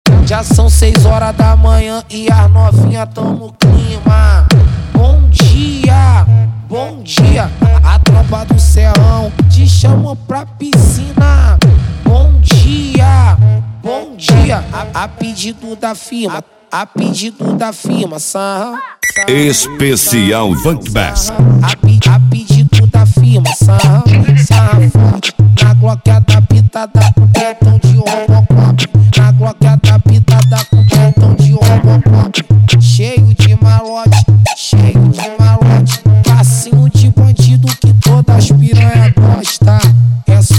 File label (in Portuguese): especial funk bass